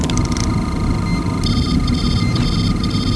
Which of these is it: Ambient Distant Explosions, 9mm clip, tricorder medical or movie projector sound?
tricorder medical